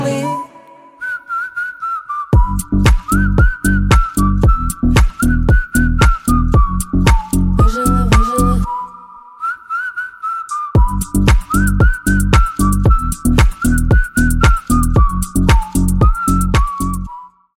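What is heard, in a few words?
svist, whistle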